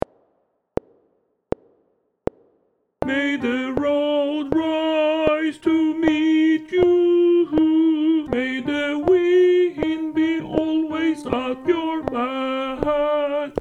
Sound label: ALT CLICK